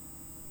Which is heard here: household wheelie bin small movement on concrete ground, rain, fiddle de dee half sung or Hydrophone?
Hydrophone